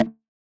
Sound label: perc bongo, Hip-Hop Drum One Shots; SD CAICOS Hip-Hop Sample Pack; Slate